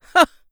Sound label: laugh; Eternal Return; ENG